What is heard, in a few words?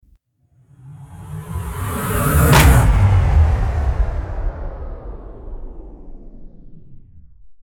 Hit Downer; Ninja Tracks